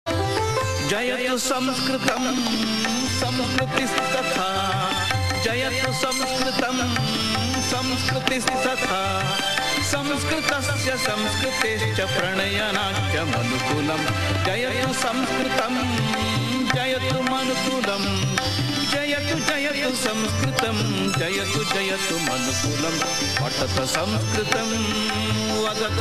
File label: sanskrit classic, ringtones